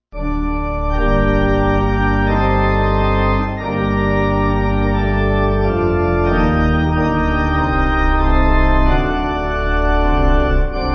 Pipe

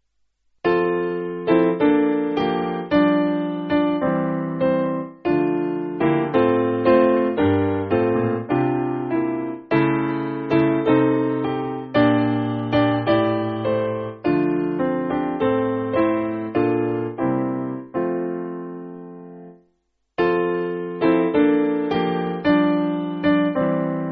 Piano

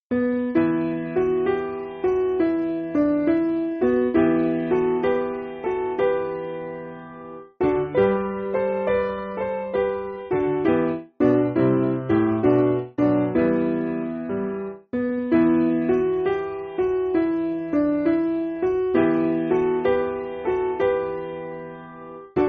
Piano

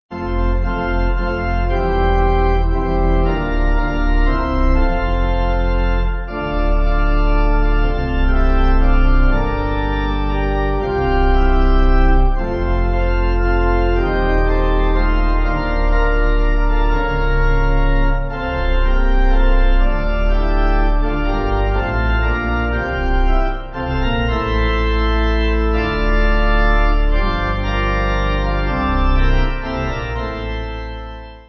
Pipe

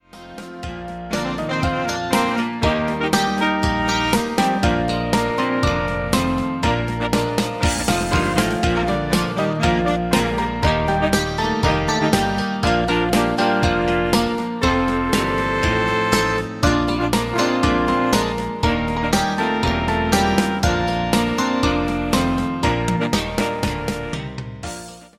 Horns

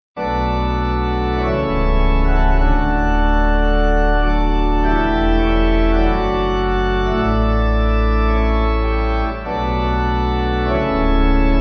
Pipe

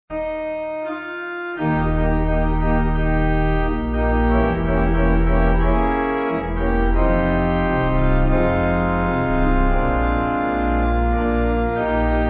Pipe

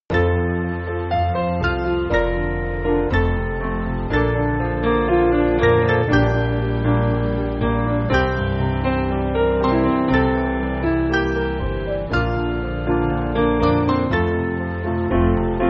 Piano

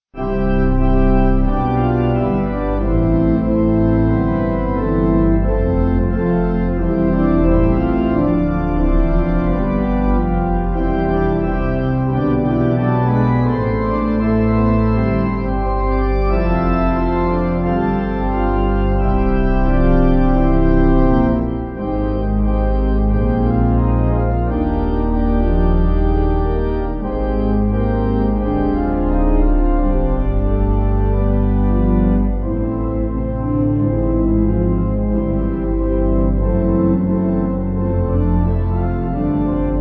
Slower Pipe

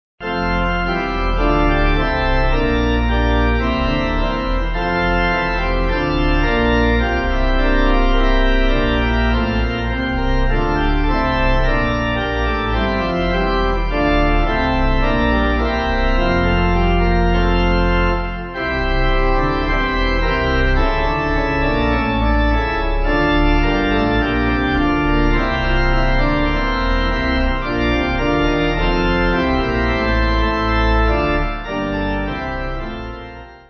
Pipe

